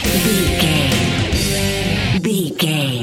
rock power stinger; Sports, Sports Rock, Pop Rock, NFL, Football, Sports Music, Rock, Hard Rock, Metal, Driving, Bikers

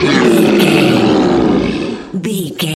troll monster death

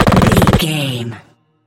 gun submachine silenced shot

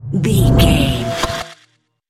fear confuse horror spell

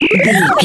retro computer code signal

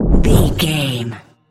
bullet leave barrel slow motion

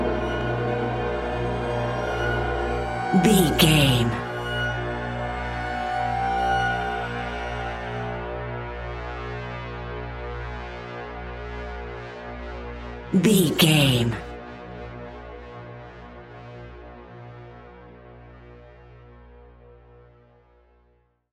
lullaby modern horror

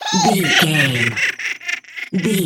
goblin fairy laugh low

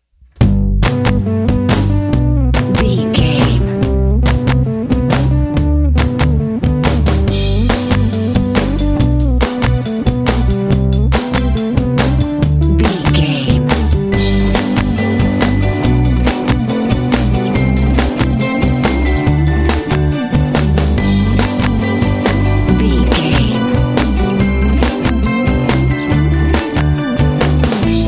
pop indy special

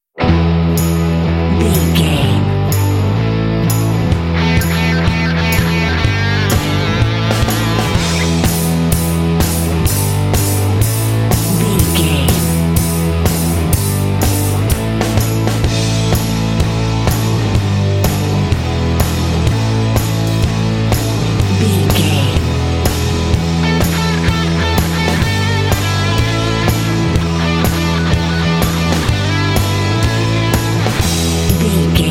rebel biker dude no lead

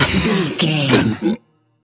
troll monster cough

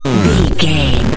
retro crash damage

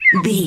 whistle slide down

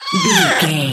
goblin fairy attack

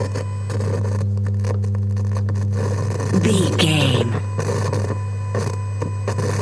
radio tv electronic static hum loop

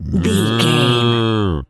cow moo

